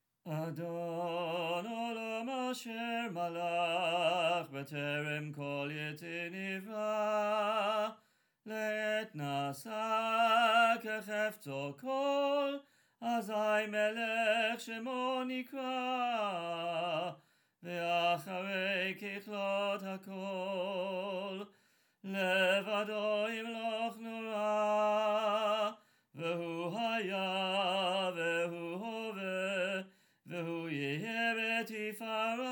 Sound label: Adon Olam, jewish table singing; hymns; Jewish liturgy; Sir Arthur Sullivan